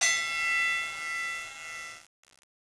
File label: respawn; gusanos promode